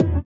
sitar, Indiatek; Spectrasonics; Metamorphosis

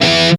METAL POWER; East West; Global Sounds

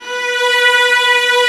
Vla B3; Digital Sound Factory Virtuoso

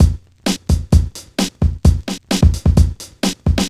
130 drum loops; Sassafrass; M8; Electronisounds

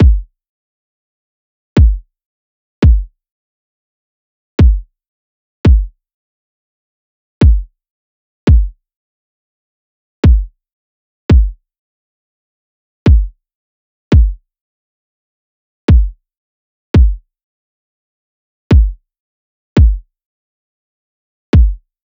loop kick 85; Seismic Loops

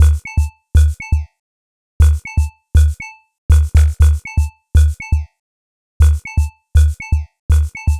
Robot Thunder, Modular Electronica; Beats; Sonic Foundry (Sony Creative Software)

